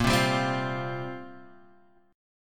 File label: F Suspended 2nd Suspended 4th Bb